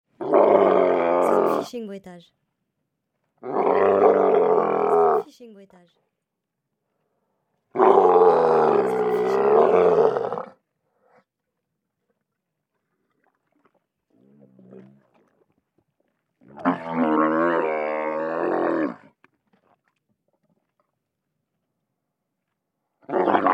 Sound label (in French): Bruitages de chameau et dromadaire; Sound Fishing